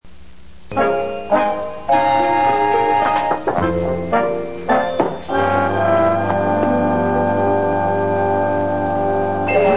music

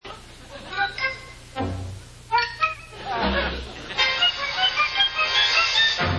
break music

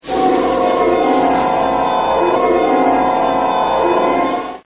float music

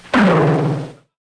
bonk

